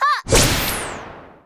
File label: Turncoat Miler attack